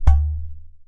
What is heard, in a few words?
udu HIT, Udu Clay Hand Drum; ETHNIC and WORLD PERCUSSION